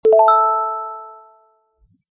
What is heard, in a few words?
shop notification